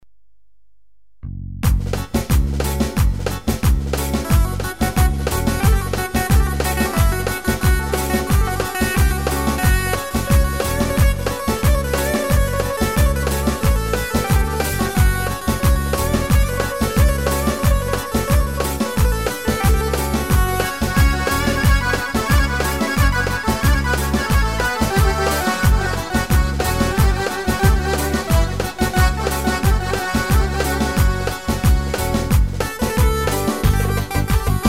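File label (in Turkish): sx muhacir, ketron ritim; yeni ritim demo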